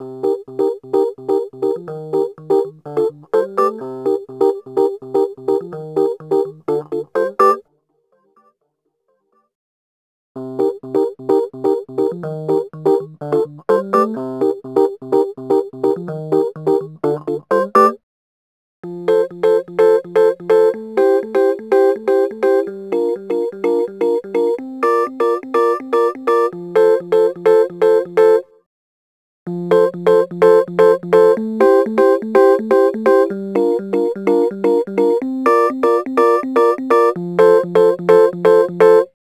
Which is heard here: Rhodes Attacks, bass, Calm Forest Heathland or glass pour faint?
Rhodes Attacks